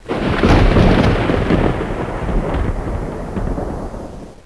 thunder

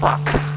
gurp; opentdm; dalek

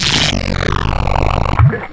DROWN

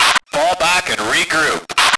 radio; lmctf; andromeda

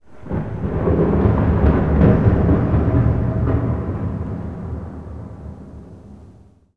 thunder

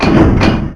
train

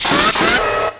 medic idle; medic commander

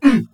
bump; baron

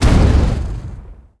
boom; spells

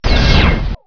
laser

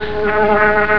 jump; waspy

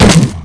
fall; Zumlin

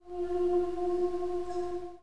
choir

